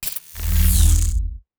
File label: ui industfinish single